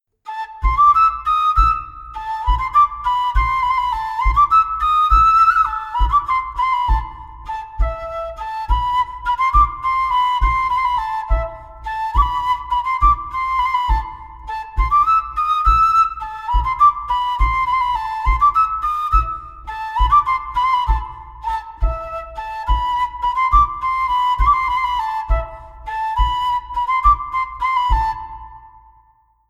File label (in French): Montagnarde